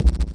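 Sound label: heavy distorted bass drum; bd; drums single hits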